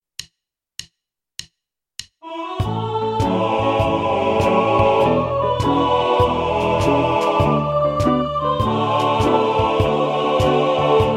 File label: Blues